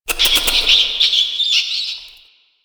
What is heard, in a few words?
Subway Mice; Ambience; Warframe; Nineteen Ninety Nine